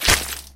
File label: player bullet hit